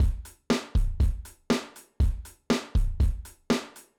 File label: drum